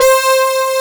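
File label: mix brass C 3, funk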